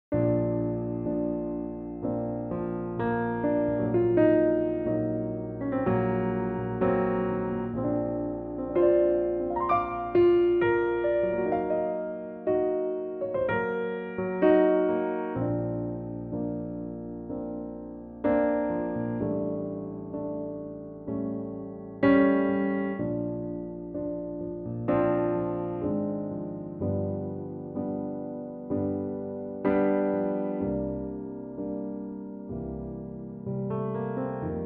piano

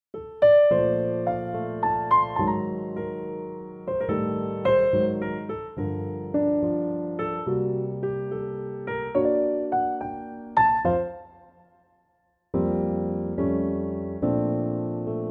Bb piano; Pianotrak; key - Bb - vocal range - Bb to Bb; Lovely and intimate 2016 piano only arrangement of this wonderful old standard

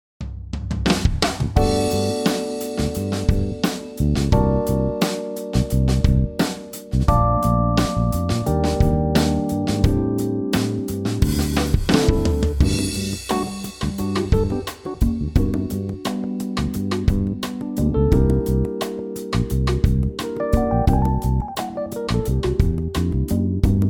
G smooth; key - G - vocal range - B to E; Super funky smoothness

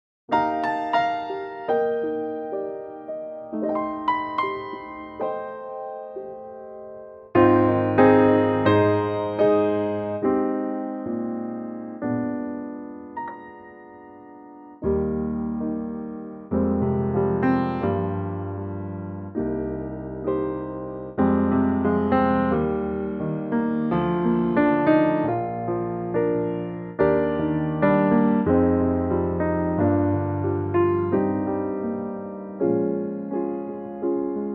piano Eb; key - Eb - vocal range - Bb to F

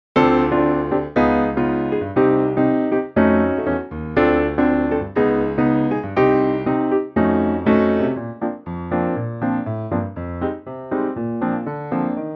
Bb Piano; key - Bb - vocal range - Bb to D#; Superb piano

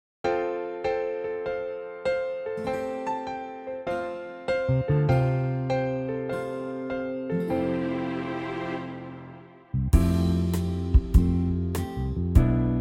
C orchestral; key - C to Db - vocal range - A to Eb; Wonderful orchestra arrangement; with a key change at the instrumental

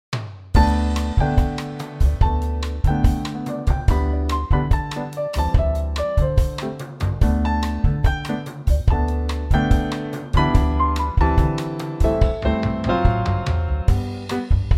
G latin

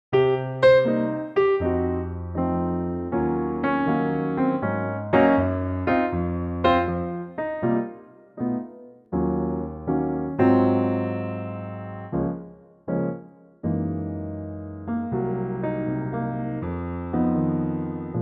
Db piano

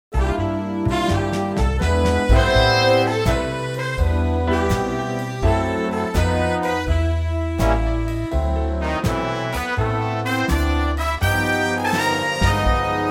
big band C

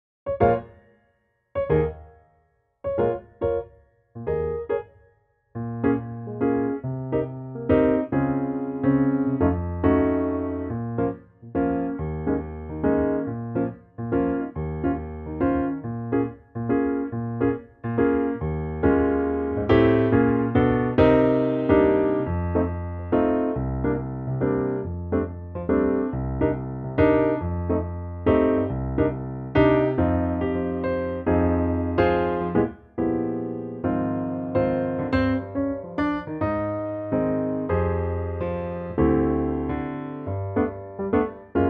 Ab piano

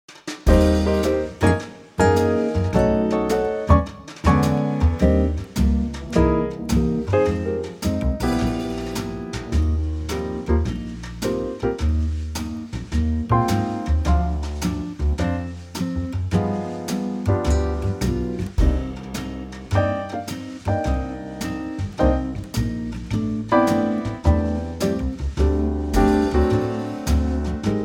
Eb Quartet; key - Eb - vocal range - G to Bb; Quartet arrangement and at a great tempo

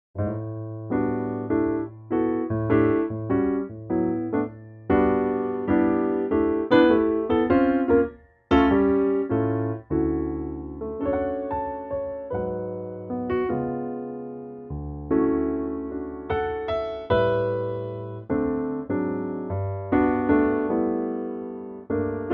D piano; key - D - vocal range - G to A

